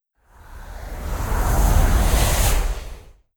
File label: Air Wind